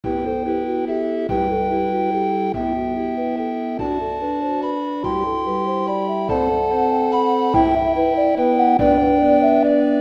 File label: Recorder; We3Kings